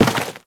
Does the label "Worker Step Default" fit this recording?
Yes